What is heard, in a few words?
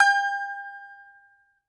BAGLAMA G0ML; E-MU Producer Series Vol. 7 – Old World Instruments